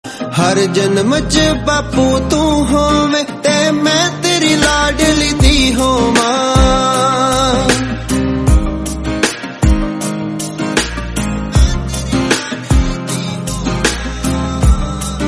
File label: lofi punjabi song